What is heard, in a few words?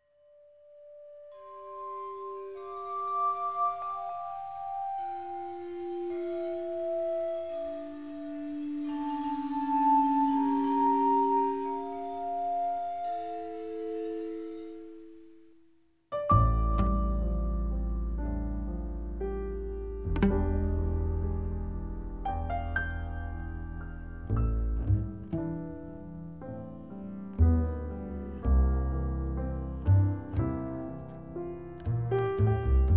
instrumental